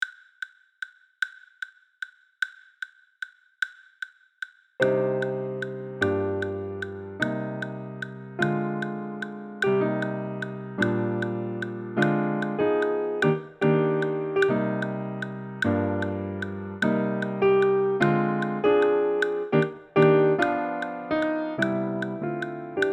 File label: Eb piano fast, sax